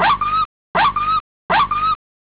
whimper

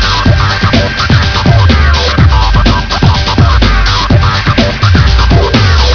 step up, radio loops

